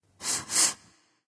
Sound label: sniff quick; odin; smite